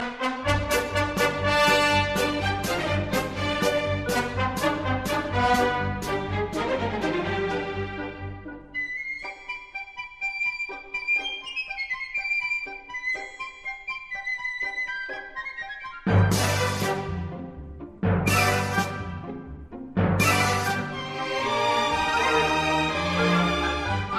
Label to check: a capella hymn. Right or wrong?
Wrong